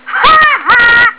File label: haha